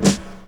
SD, snares; EastWest; Dance Mega Hip Hop Electro

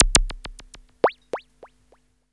FX LP, Grunge Hip Hop; USB Soundscan vol.34; Burning Grunge Hip Hop; AKAI

